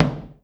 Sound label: Tom Low